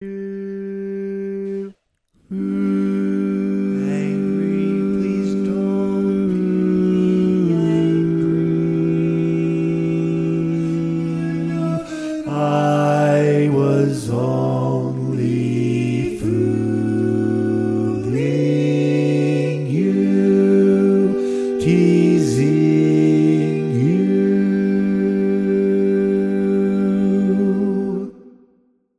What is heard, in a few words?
Please Dont Be Angry, Bass, Barbershop